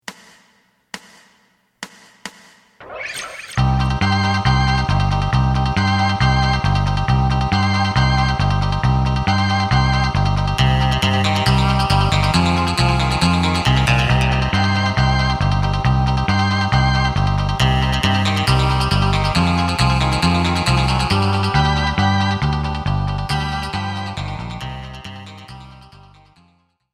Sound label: drum